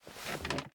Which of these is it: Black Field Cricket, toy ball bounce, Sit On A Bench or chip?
Sit On A Bench